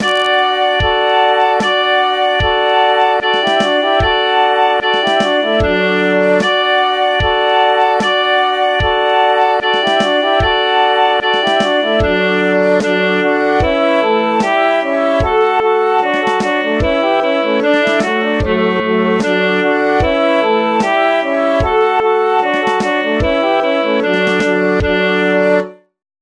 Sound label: Switzerland flag anthem